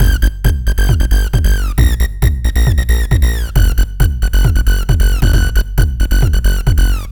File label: Synth Bass